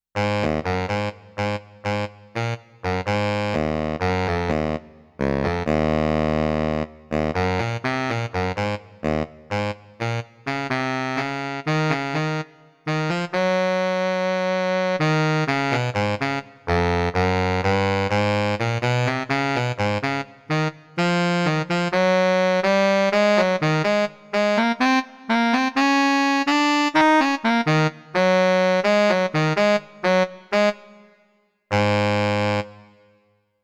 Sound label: Baritone Saxophone Technical